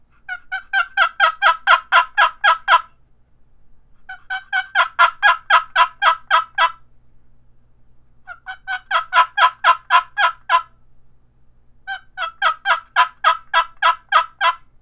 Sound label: cedar cherry box call yelps, turkey; Southland Sounds